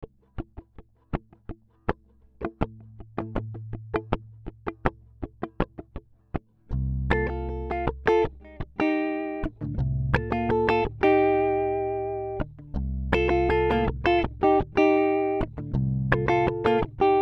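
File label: Riffy Strummy guitar in C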